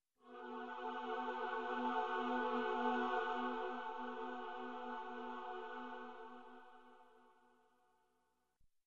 Floating vision choir

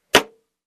Construction

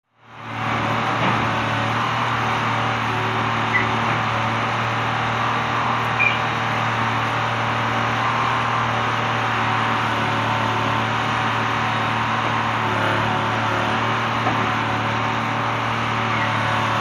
Construction

